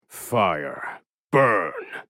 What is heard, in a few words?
fire burn; oswald